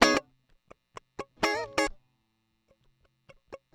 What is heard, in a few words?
128 Latin Jazz Guitar F#Maj; Digidesign; Pro Tools Essential Loops